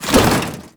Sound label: Infested Hammer Idle Whoosh, Weapons